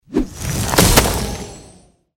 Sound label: attack; hero sound; duoduobing